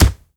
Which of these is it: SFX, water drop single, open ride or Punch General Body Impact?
Punch General Body Impact